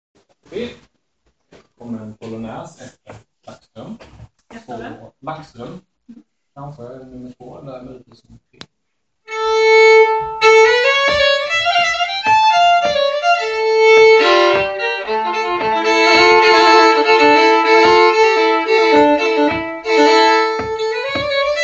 Polonäs; efter Lackström

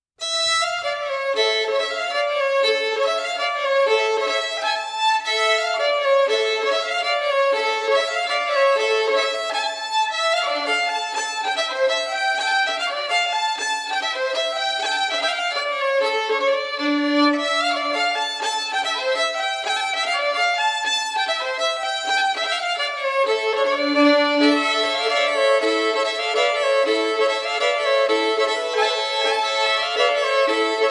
Springlek; Sarvin; Troskari Erik

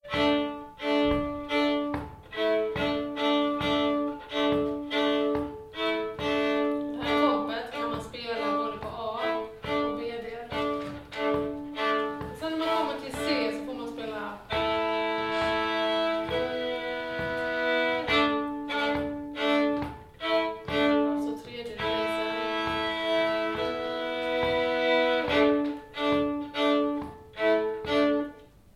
Engelska fr Skåne, komp

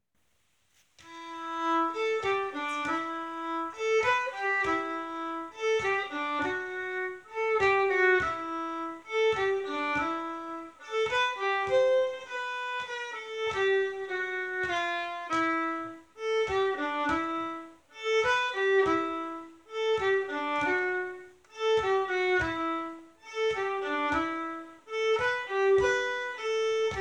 Partekpolska motmelodi

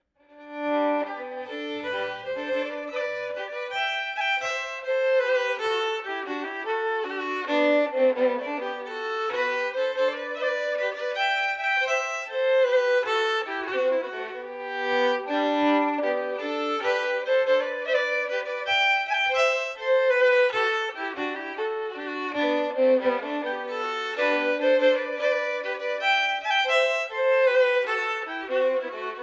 Julottan 1&2-stämma

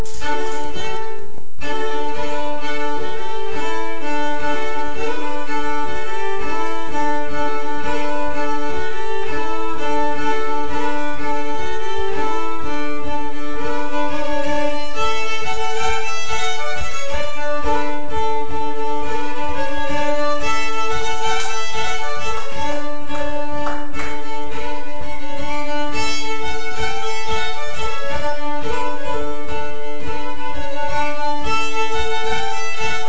Finnskogspols

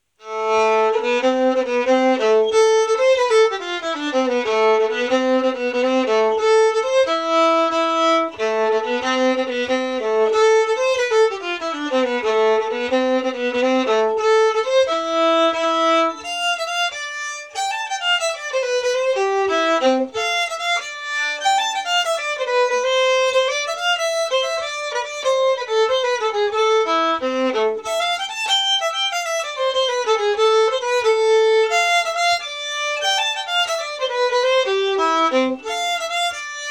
Slängpolska; efter Anders Jeppsson

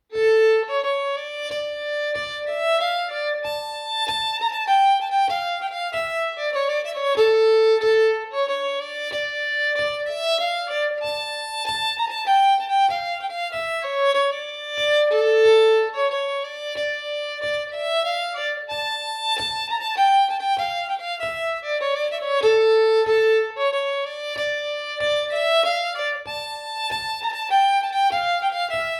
Polska från Ön (moll)

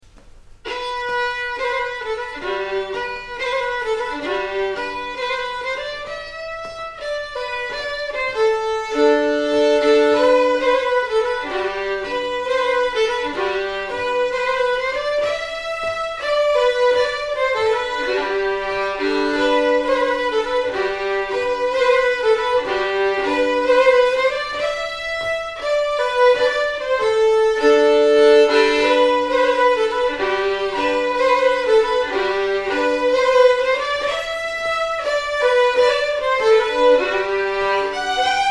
polska

